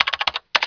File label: button; diekrypta